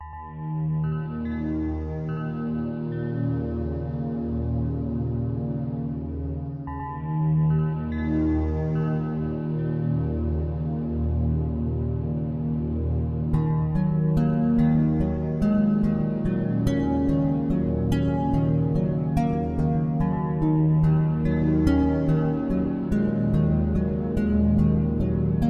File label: Whale dream